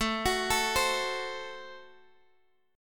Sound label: A Minor 6th A Guitar Open F; x,16,18,16,15,x; arpeggiated; Am6